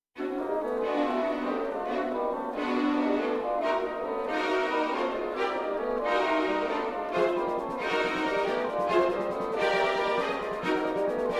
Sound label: Synth